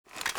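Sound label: weapon foley mini uzi reload lift; Call of Duty Modern Warfare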